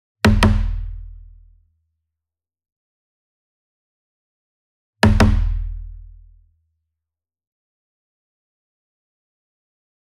SINGLE DRUM Kodo Double Hard Beater Near, Cinematic Strikes; boom library; Cinematic Strikes Construction Kit